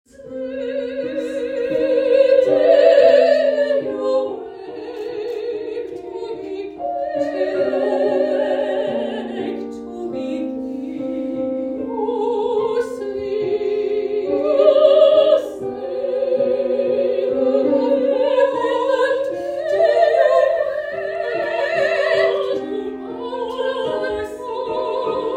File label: lullaby no orchestra